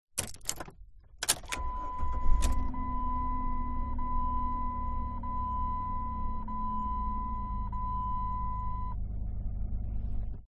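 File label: Car Key Turn Inside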